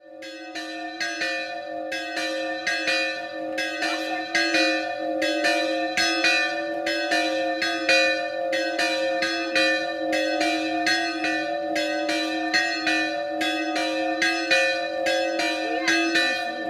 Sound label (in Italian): Doppio, campane; Priuso